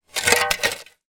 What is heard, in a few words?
shovel drag on soil; industrial